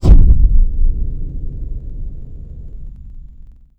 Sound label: avre distant shot; WAR